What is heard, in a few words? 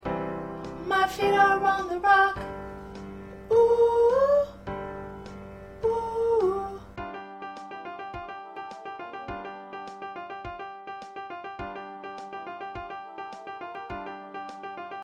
Backup